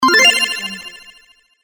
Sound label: charging